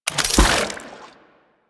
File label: Harvester Item Pickup; Ambience; Warframe; Venus Open World